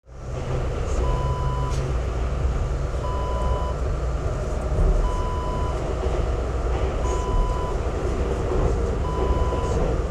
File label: train interior